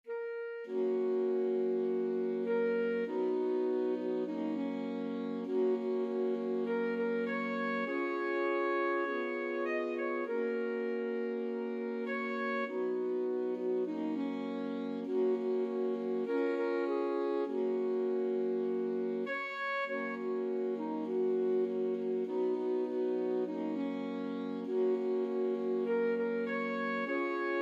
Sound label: swing low alto sax quartet